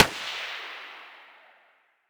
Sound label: scar muzzle mono far